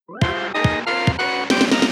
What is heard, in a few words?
Theme Intro - 140 bpm; Bauss